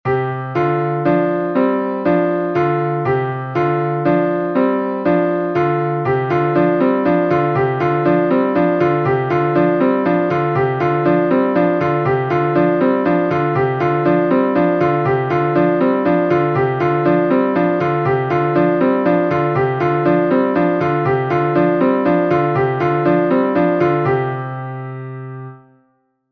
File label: Fourth and Fifth Finger Exercise